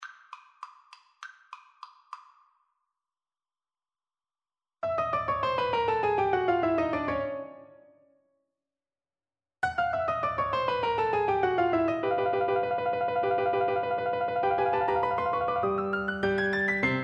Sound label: Fucik Entry of the Gladiators, oboe